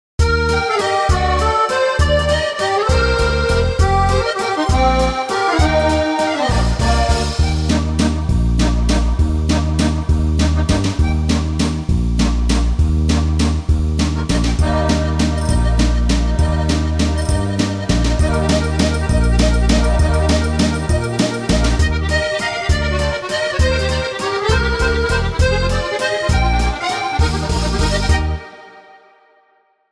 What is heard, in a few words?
musette waltz